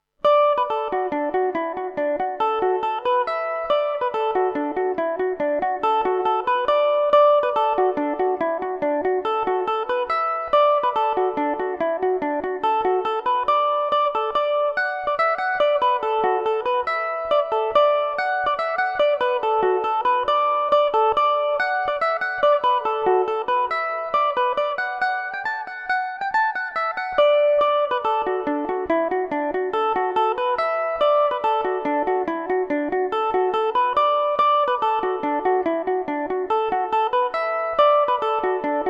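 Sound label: polka D major revelation, irish mandolin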